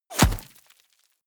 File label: bullet small impact dirt; krieg-waffen; geschoss